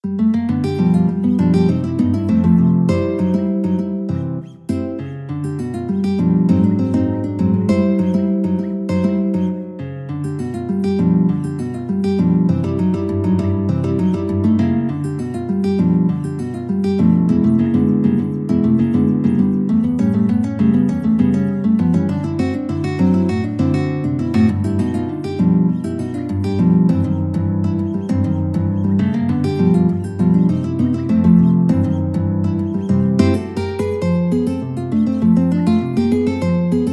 blues, guitar; memphis

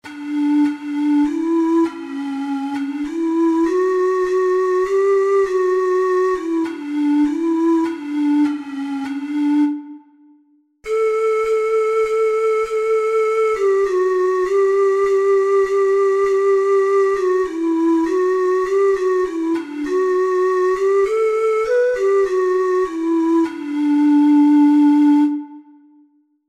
ocarina four hole

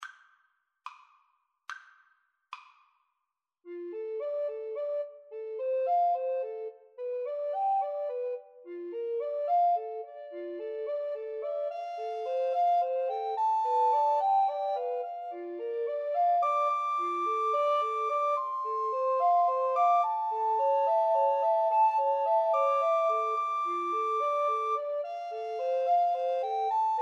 house of the rising sun, Recorder Trio

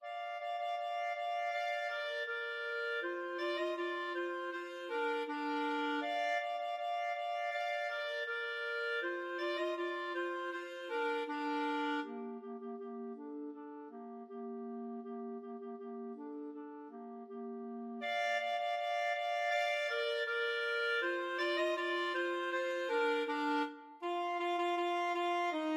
clarinet duet

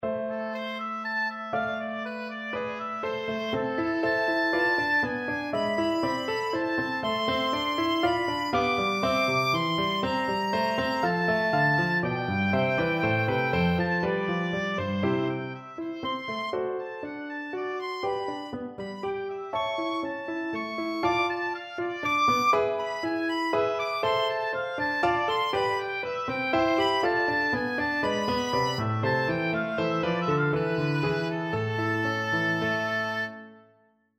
bach, oboe

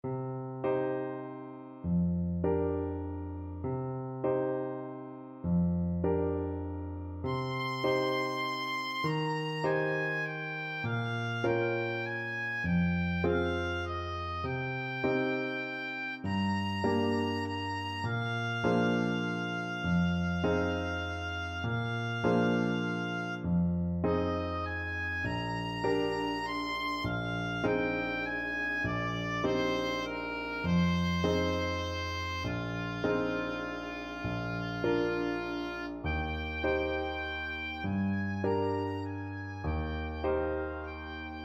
satie gymnopedie, oboe

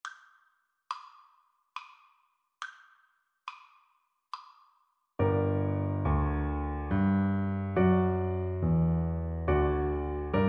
air, Tuba

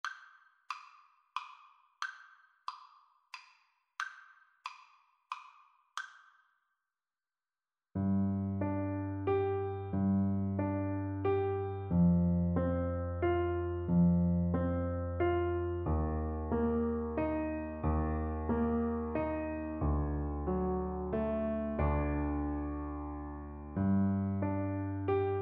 greensleeves, Tuba

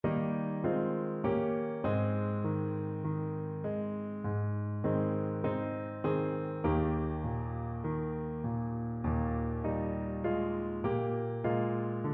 trumpet

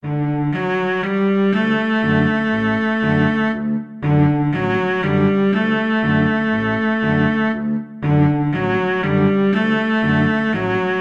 2 cellos 2 basses

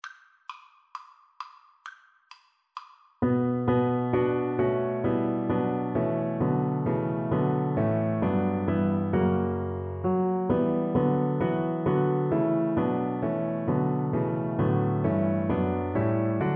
DB, Double Bass

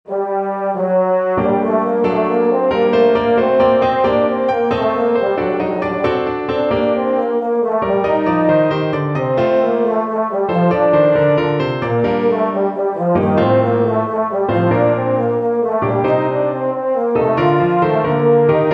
EUPH, euphonium

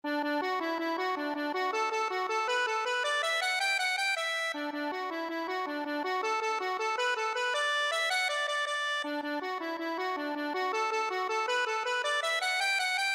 accordion

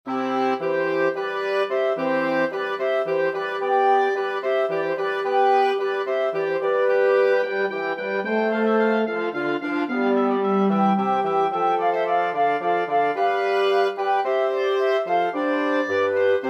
water music, flexible mixed ensemble 6 players

